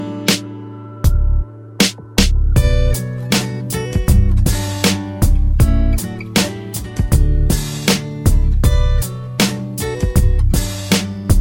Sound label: Acoustic Guitar Beat